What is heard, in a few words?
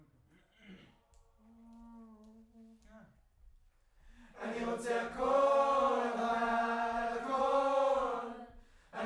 Choir Far